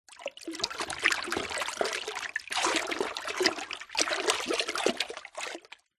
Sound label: household water splashes movements in plastic mop bucket; zapsplat